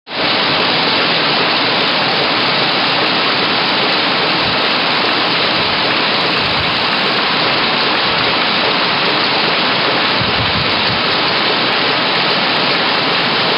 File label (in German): Feilenfabrik Turbine Maschinen; Geschichte der Feilenfabrik Ehlis